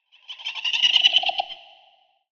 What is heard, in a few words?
girl laughter; soundtracks